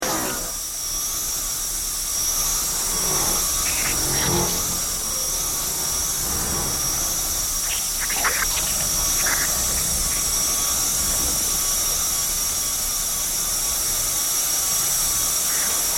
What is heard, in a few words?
Tense insect shrill